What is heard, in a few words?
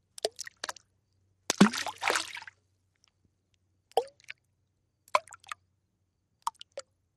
Splash Sml Stone Plop